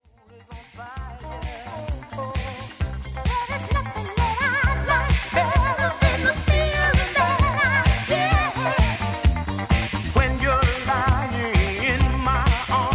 ARPEGIO

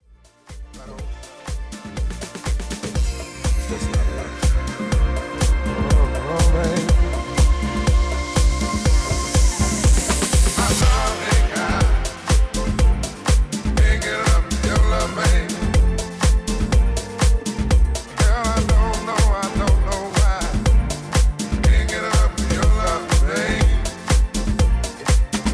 Nu Disco Mix

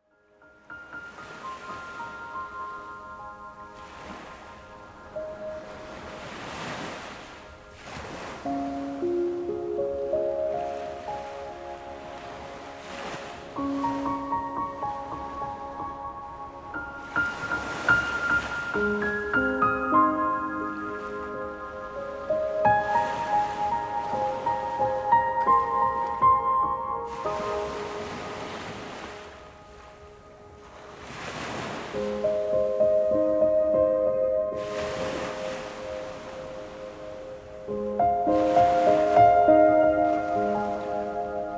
Wellness Ocean Waves

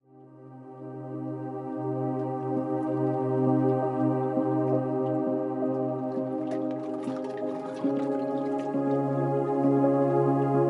Relaxing Music

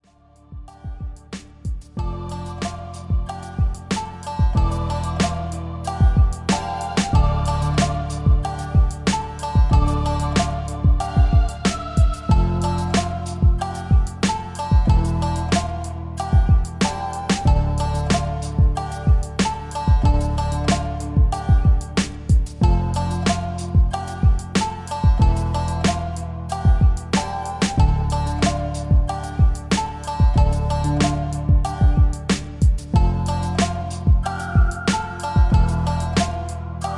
Chill Asian Style Beat

